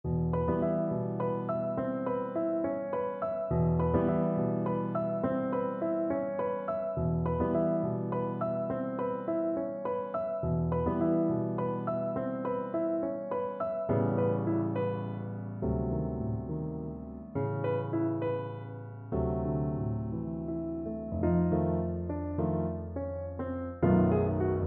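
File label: VLC karaoke, Cello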